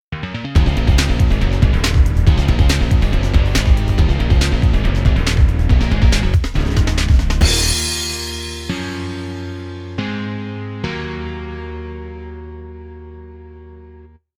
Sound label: Rock Intro; Intro Outro